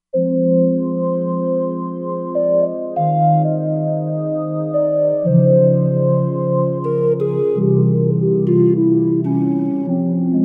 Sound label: Angel Pipes, Roland Fantom XR